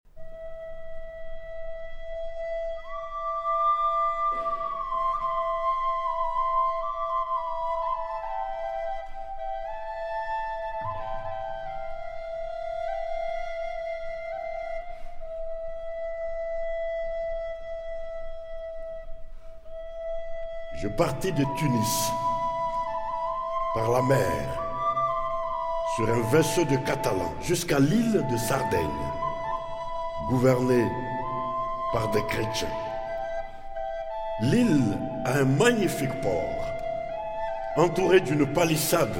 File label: Improvisation (Double Flute) and Narration